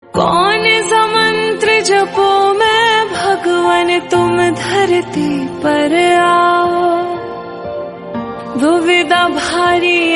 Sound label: Hindi song